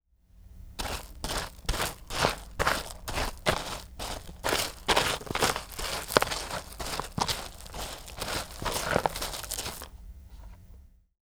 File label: Box of Gravel